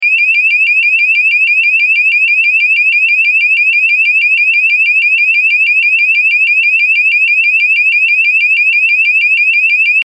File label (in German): Ton